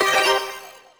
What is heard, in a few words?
enemy Dead; Popslinger